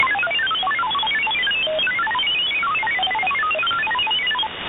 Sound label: Mfsk7739 unk